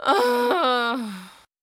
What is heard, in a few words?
Angry